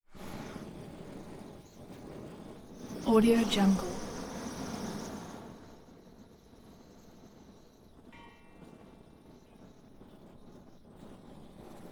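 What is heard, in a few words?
flamethrower close up long burns short bursts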